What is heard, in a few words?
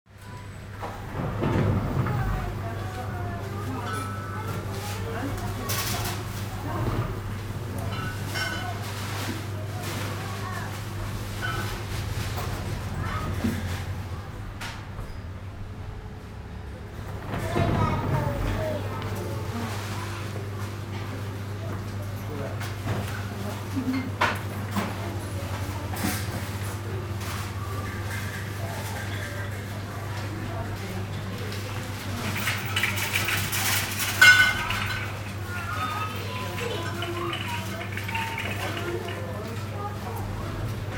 supermarket with door noise